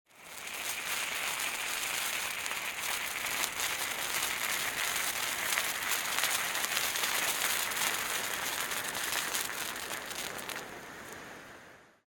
zvuk gorenija; звук салюта или звук фейерверка

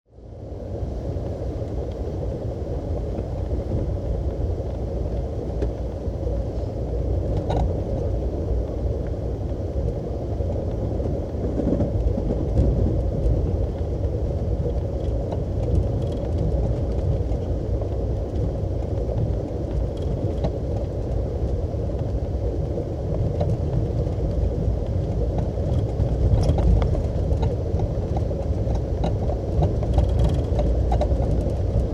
vnutri passazhirskogo kupe poezda

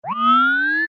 multjashnyj zvuk dvizhenija kamery

